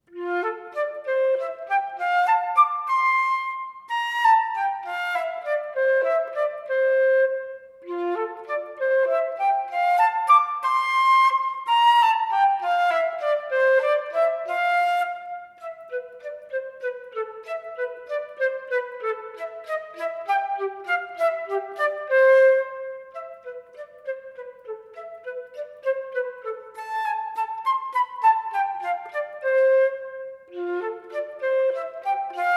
study in f, flute; gariboldi

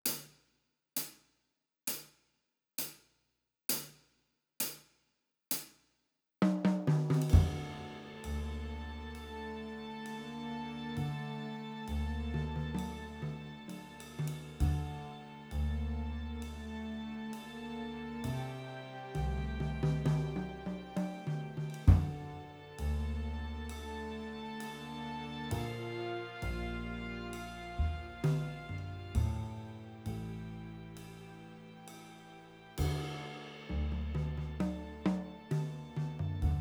Slow Blues Backing Slow

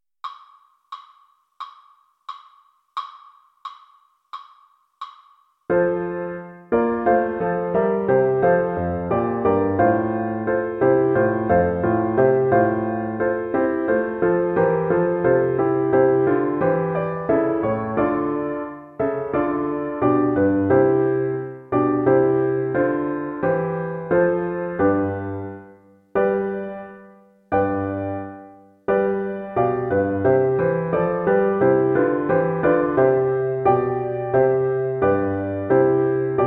Telemann Sonata in F Vivace Piano only

